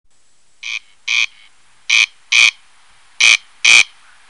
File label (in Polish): Derkacz